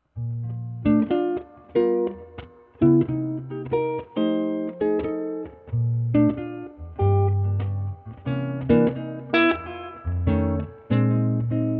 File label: Improvisation Bluesy, Solo Guitar Improv; Ted Greene